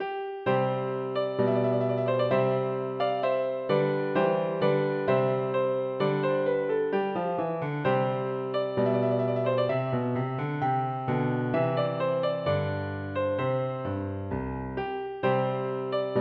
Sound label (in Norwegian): Marsch for piano; Aamodt